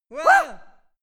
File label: two scared men